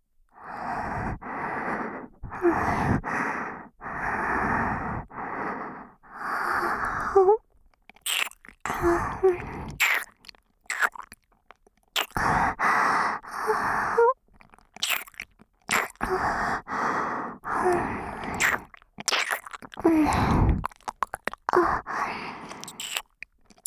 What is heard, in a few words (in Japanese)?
スローおまんこ寝かしつけループトラック, ASMR